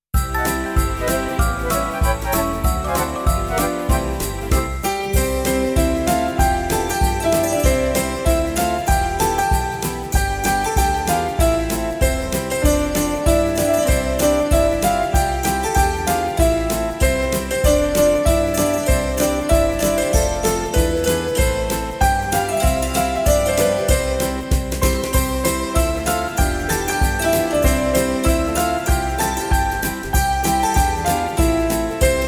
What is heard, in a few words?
quickstep